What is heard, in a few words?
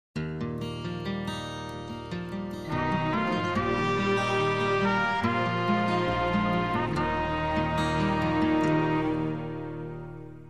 Acoustic